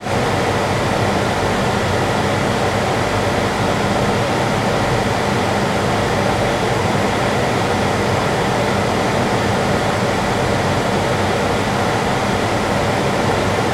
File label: office laboratory ambience room tone spectroscopy; bristol university; tom chapman